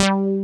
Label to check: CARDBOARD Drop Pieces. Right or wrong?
Wrong